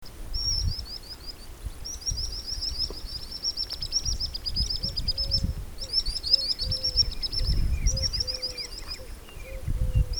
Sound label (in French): chevalier guignette, chant